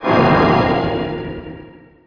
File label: force absorb; MOTS